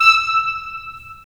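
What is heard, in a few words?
wind clarinet; Roland - LA Composer vol. 3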